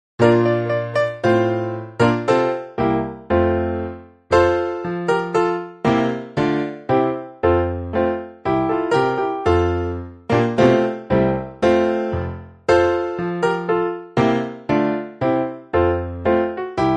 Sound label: Deck the Halls Instrumental